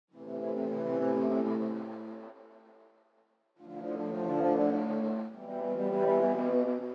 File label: Dark Melody Loop - 139 BPM A Min Pads; Loop Stems; Cymatics; 2020 Melody Collection